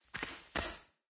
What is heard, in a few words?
Creature Sounds Walk; raycaster